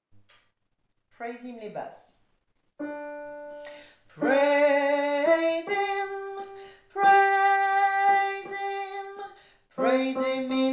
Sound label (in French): basse